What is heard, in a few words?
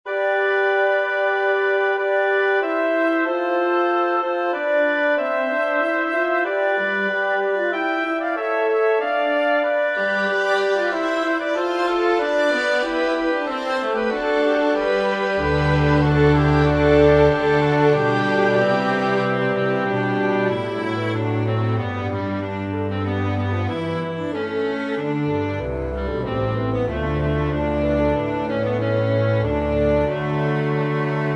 for winds strings; zielenski mikolaj; magna est gloria eius